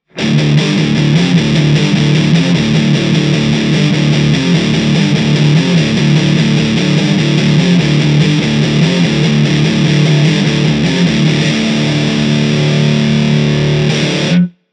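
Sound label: blackstar ht metal 60 overdrive 2 us shure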